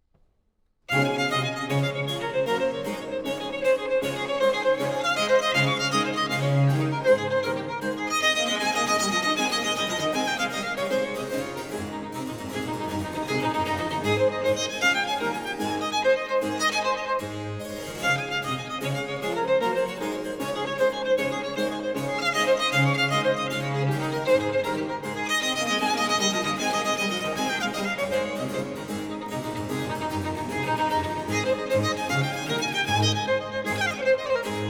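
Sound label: Sonata in D major for Violin and BC Allegro; RV 810; Antonio Vivaldi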